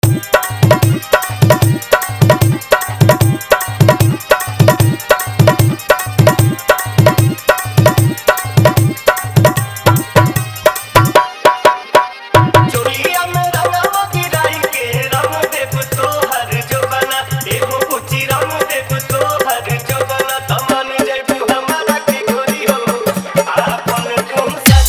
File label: Holi Dhollki Bass Mix